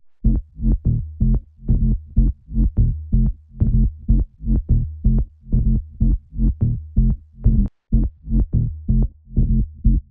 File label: 125BPM DRIVE BASS F, Bass Loops